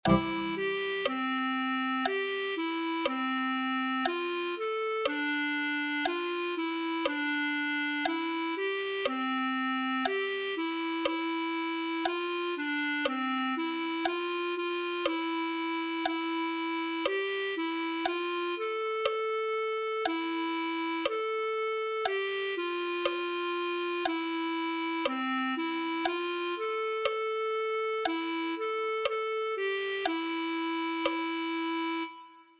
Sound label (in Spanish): clarinete1